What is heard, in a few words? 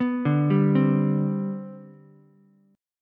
C Major 7th Suspended 2nd C down Ukulele, chord; CM7sus2 arpeggiated; JGuitar